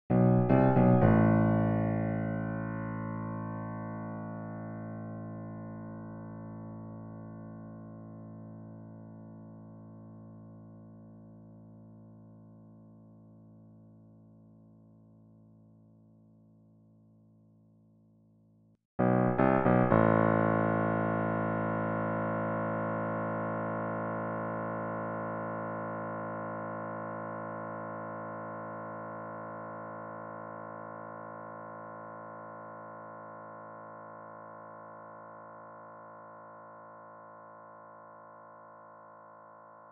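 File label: test raw sound U4, Pianoteq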